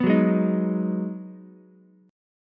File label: Ab Minor 7th down Ukulele; strummed; JGuitar